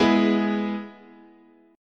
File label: G sharp Minor Sharp 5th, chord